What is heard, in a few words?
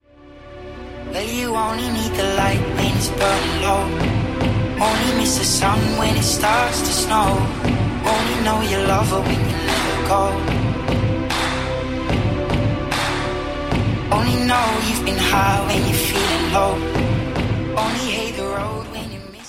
TRAP